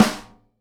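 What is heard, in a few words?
snare bottom DAMP, Live Snares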